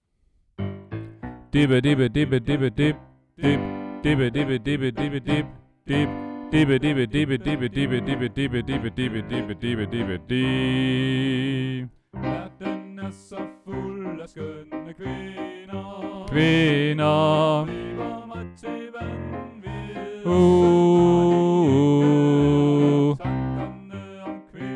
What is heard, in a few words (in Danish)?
Ostesangen Alt2; oevefiler